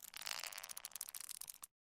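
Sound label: clenching fist; Сжимание или сжатие кулака от злости, обиды или ненависти для монтажа видео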